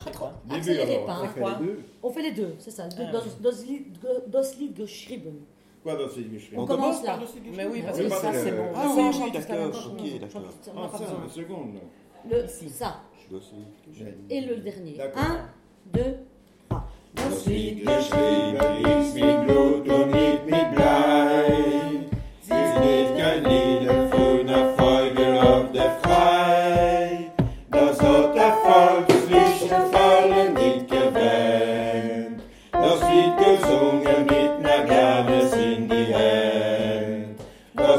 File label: basse